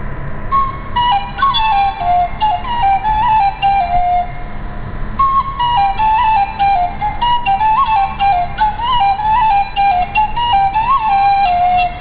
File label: pipe